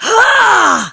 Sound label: Throw, Barks; Kuva Lich; Persona B; Ability